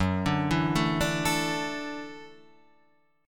F sharp Minor 7th Sharp 5th F sharp, Guitar Half Step Down